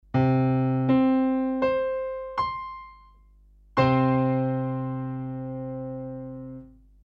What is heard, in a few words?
notes octaves